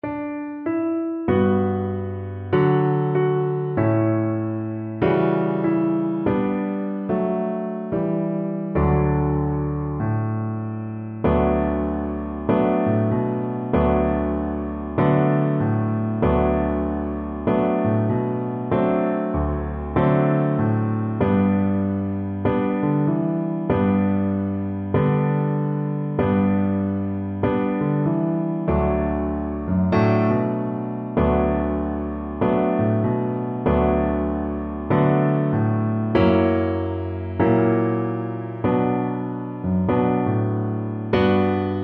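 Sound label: viola karaoke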